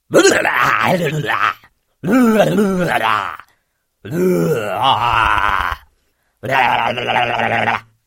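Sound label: sounds of voice of caveman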